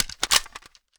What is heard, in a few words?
g36c stock; weapons